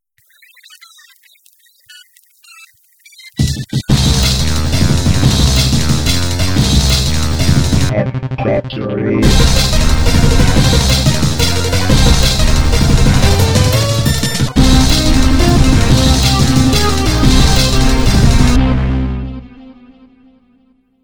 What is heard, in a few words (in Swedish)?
Jingel; Dentoid; M-P3